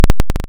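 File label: waveform FM sawtooth sine envelope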